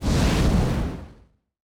flame breath

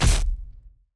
monk staff ground miss

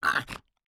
goblin bomber hurt

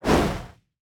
flameburst tower shot

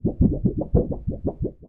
Zombie

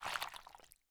Liquids honey water

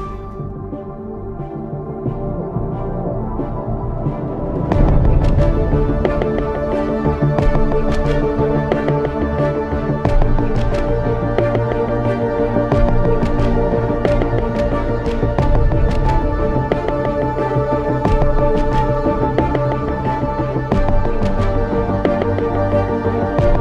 Space

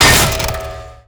ice attack; Deerclops

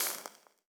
Liquids water lava

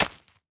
crystal cart impact

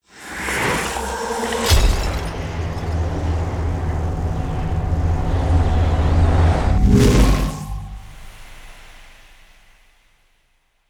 defeat scene

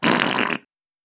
NPC Killed

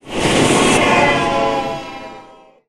NPC Killed

